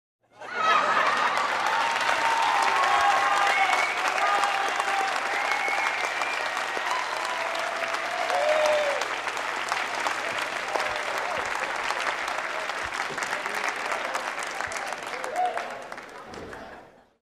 Ovation in the theater; standing ovation

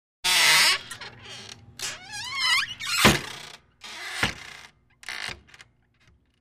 wooden door opens and closes with creak

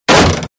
Striking a wooden object

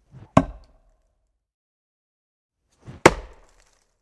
Throwing a battle ax, flying by, hitting a tree; cold weapons

